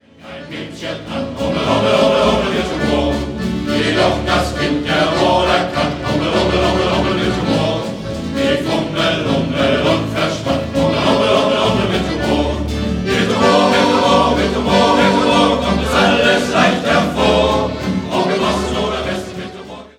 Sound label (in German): Shanty Chor